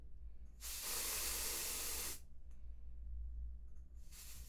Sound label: Vapor Glued